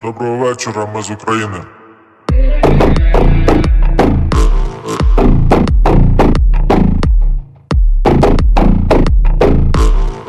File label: slowed reverb